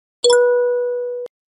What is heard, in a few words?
Thrung; Icon Sounds